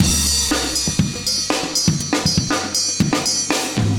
120, loops; Sound Effect Library

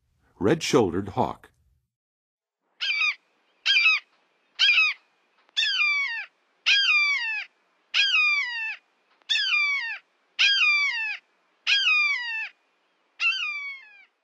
Red-shouldered Hawk, Birds of North America; Western USA; Western USA Songbirds

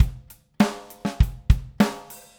Basic Beat, Rock Drums

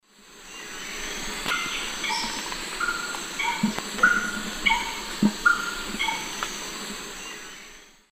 Campylorhynchus turdinus, alternate song; S. America; Eastern Lowlands

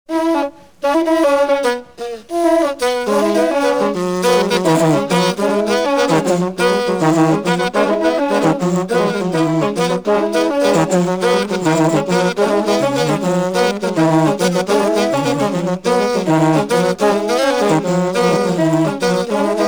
Nyoro Royal Horn Ensemble (Uganda); World Music; AFRICA; 1950ies; Best of Hugh Tracey Africa

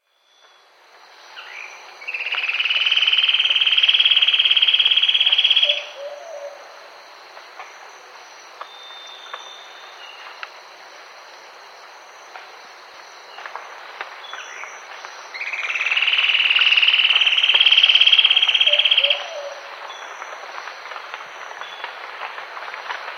Chestnut-Belted Gnateater; S. America

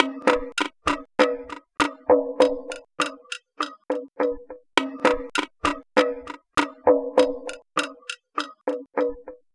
Phased Pans Beat; Electronica; Solo Beats

